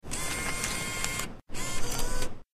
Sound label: vend mach bill feed